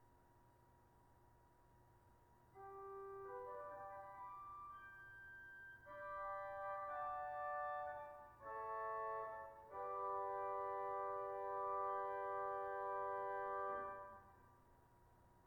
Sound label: positif quint, organ; 2 2/3’ Nazard; A mutation stop of 2-2/3' in the manuals and 5-1/3' in the pedal.